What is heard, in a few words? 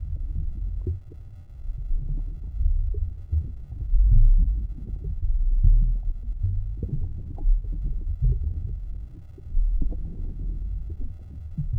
hacking interface ambience